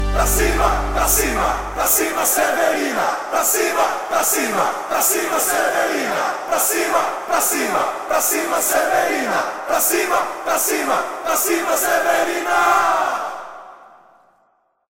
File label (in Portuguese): grito de guerra